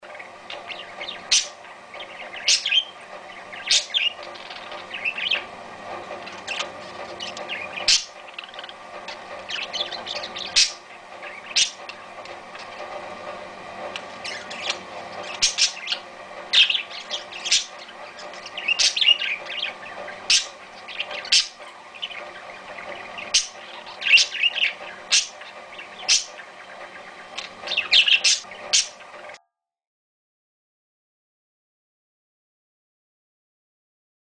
suara burung parkit

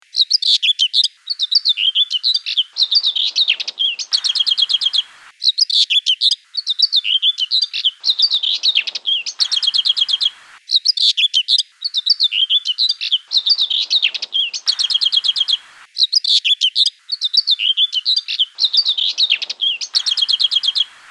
whitethroat dan leser whitethroat

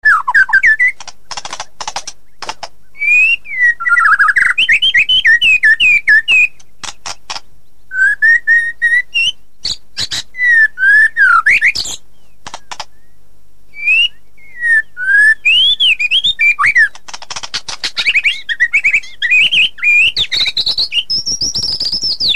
murai batu

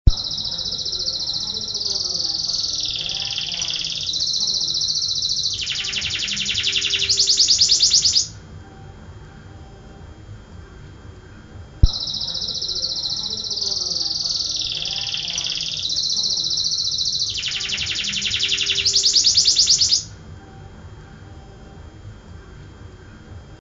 audio kenari

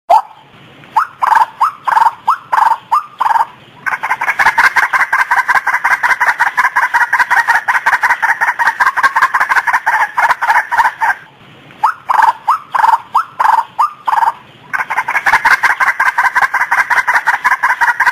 suara betina ruakruak

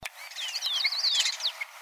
suara serinus xanthopygius

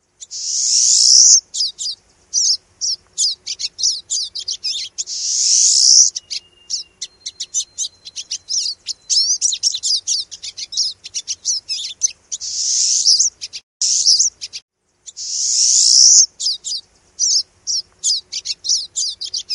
suara burung kenari isian manyar

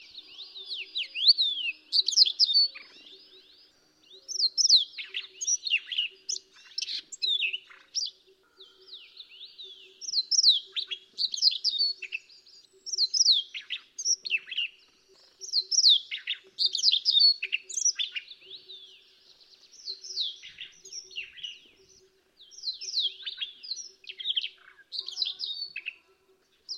suara siberian rubythroat

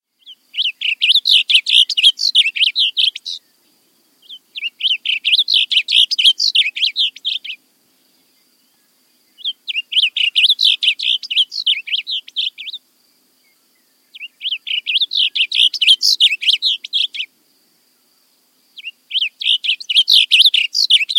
suara kardinal biru

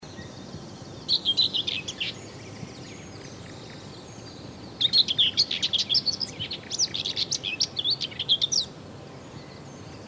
whitethroat varian

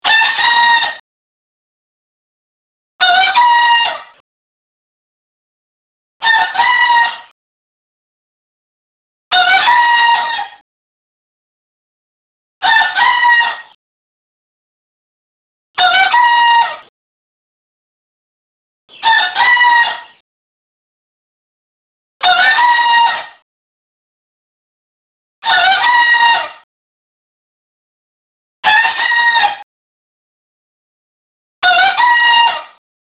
ayam bekisar; juara nasiaonal

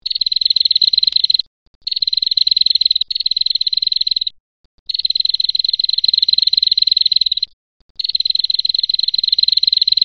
suara burung prenjak betina

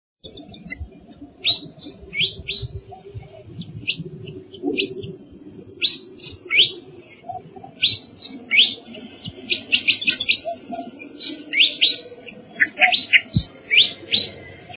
suara burung kipasan belang

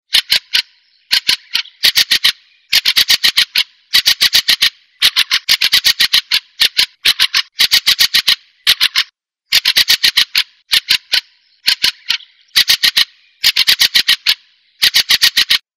platuksampit, agroburung

